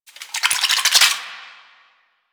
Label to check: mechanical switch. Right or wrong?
Wrong